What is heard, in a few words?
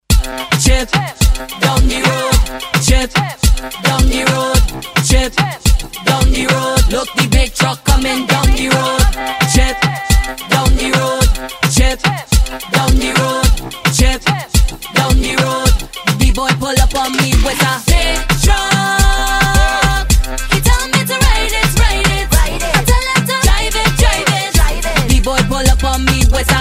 big truck, soca grooves